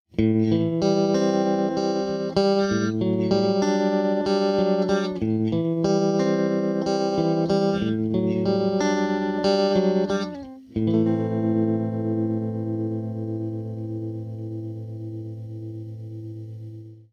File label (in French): clair; behringer iaxe